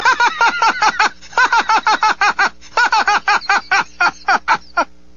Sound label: Laughing; Stewie